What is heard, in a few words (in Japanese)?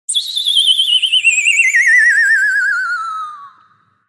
ロケット花火の音